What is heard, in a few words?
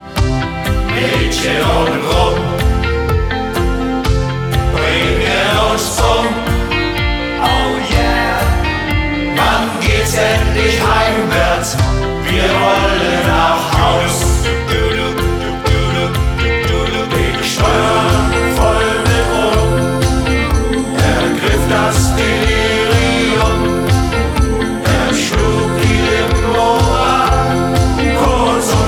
Shanty Chor; Dorum